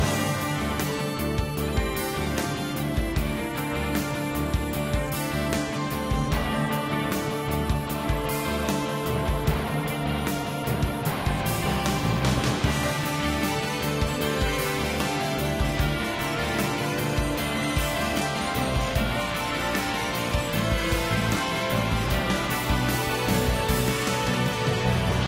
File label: BGM Dungeon Hard